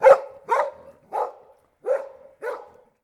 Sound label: dog distant; DeadFright